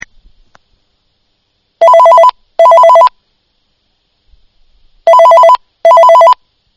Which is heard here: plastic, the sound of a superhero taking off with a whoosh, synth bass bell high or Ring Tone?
Ring Tone